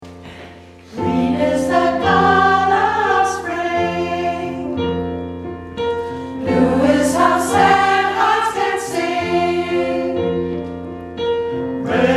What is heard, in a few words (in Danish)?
tutti